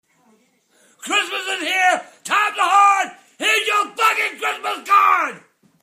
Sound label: Christmas Card